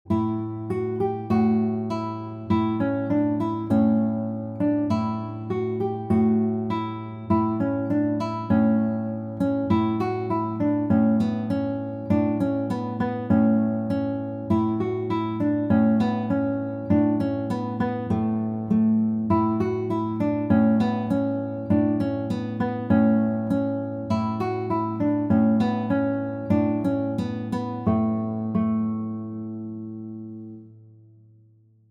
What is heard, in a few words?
Musette, guitar